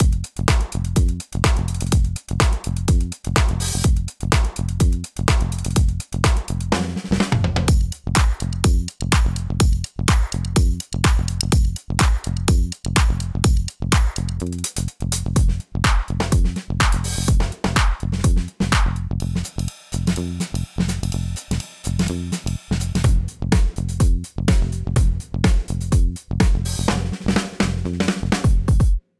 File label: Groove Pack 60's; Idobangers; חבילת גרובים בהשראת מערכות תופים משנות ה60 עם דינמיקה חיה, סווינג וגרוב